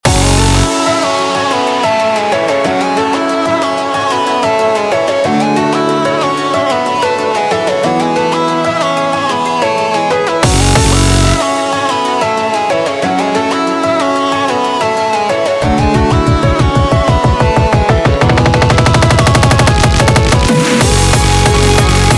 戦士ボスBGM Loop; Tower of the Approval

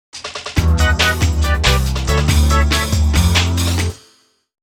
ゲームオーバージングル; Pop'n Music 4

